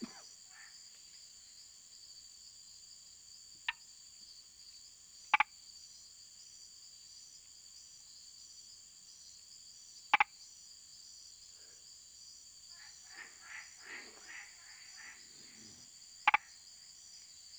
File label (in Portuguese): H. punctatus, morrinhos